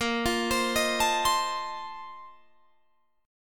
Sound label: A sharp Major 7th Suspended 2nd Suspended 4th A sharp Guitar Drop D